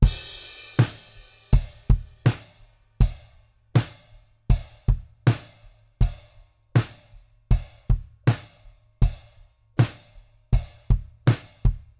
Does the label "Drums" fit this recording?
Yes